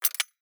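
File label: item lockpick up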